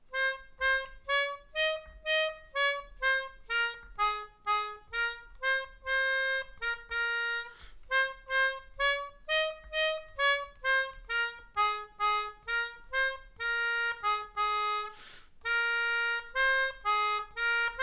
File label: Melodica